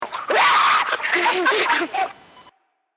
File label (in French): Lion